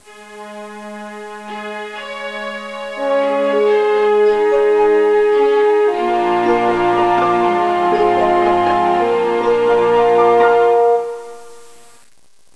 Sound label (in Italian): suonerie classica; musica classical